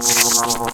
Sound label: attack